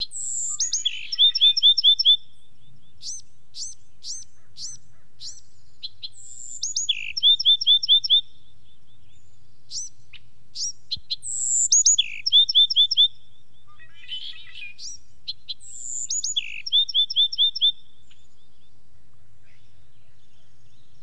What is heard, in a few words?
Bewick's wren CA